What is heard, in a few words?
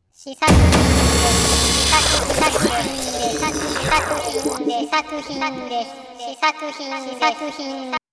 sound effects